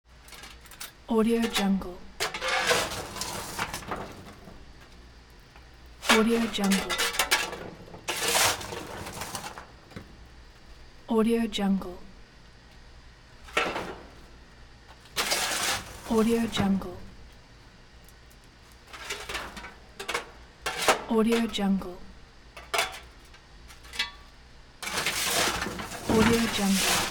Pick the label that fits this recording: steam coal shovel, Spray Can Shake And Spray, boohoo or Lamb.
steam coal shovel